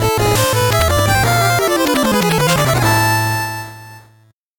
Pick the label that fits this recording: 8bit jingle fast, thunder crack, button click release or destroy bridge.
8bit jingle fast